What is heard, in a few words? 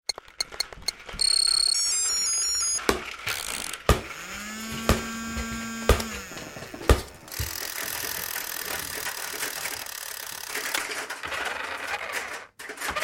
Toys; Big Room Sound